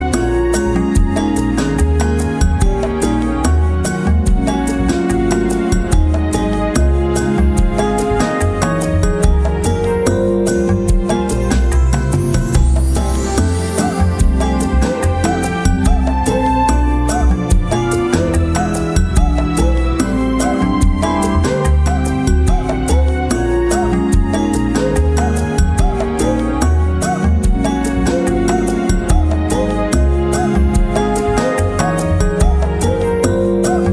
bossanova

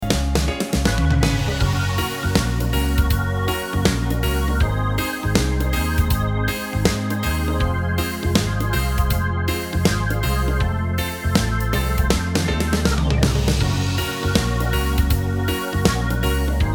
reggae

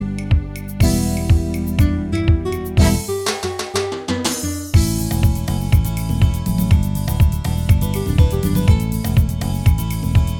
merengue

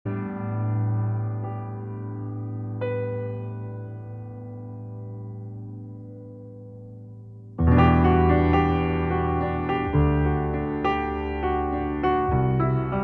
solo piano

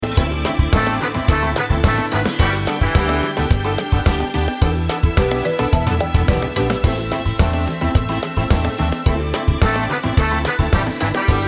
samba

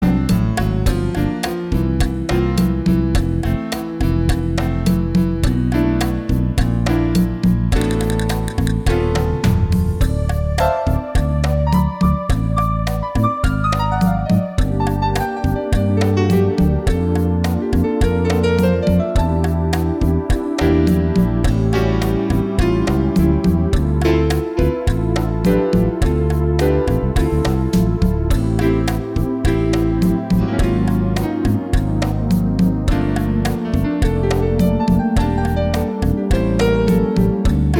jazz

